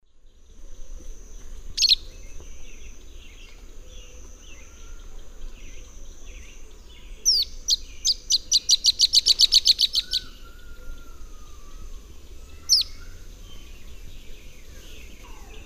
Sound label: Myiozetetes similis, call and alarm call; S. America; Eastern Lowlands